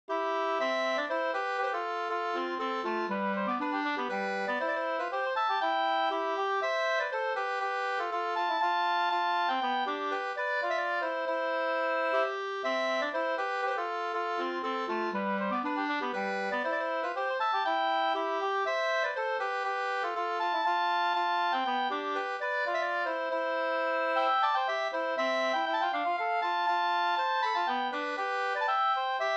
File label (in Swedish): polska Ramnaspolska